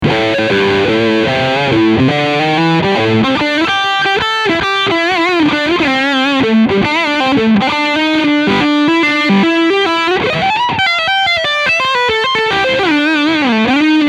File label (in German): Leadsound Steg HB